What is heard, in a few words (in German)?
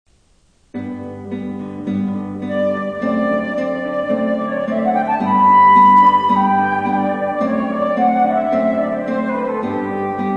Duo mit Flöte und Gitarre; Mauro Giuliani, Maestoso